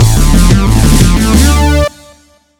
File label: Victory Jingle; Astro Rangers; PS4